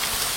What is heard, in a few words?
loader drag; okc experience